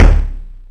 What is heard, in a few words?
SEQUENTL-TOM; Rubber Chicken Software Sample Bank; DRUMS + PERC